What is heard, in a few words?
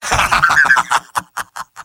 laugh happy